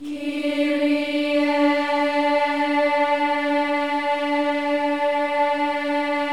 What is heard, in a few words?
KYRIE, Symphony of Voices; Spectrasonics; BC KYRIE